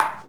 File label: Serve; HeavenStudio; rhythm Rally